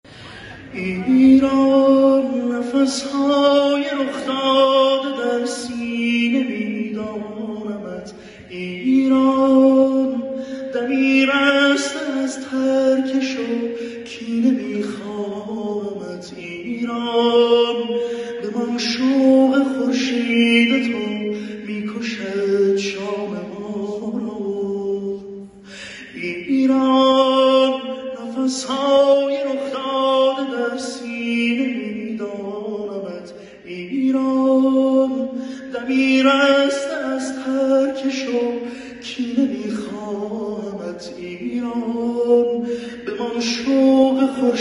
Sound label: chaartaar; iran